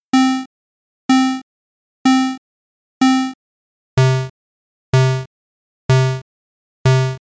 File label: tone